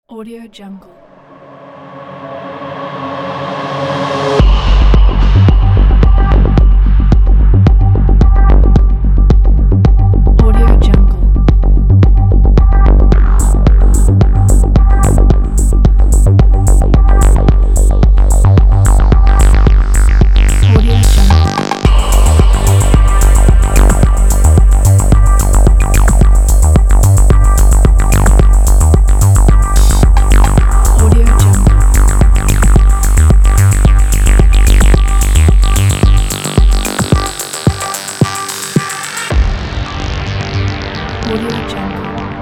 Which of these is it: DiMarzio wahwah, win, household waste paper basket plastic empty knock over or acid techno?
acid techno